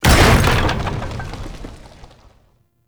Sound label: Spike Trap Fall; Objects; Ode Lantern Crate